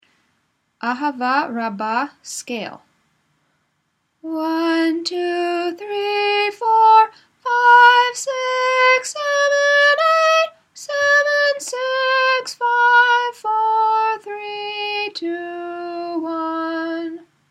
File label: weekday ahava rabba scale, nusach